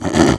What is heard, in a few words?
bigfoot snore